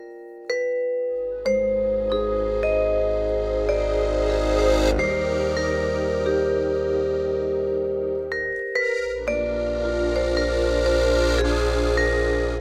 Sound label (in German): Landeshymne; Pretüllische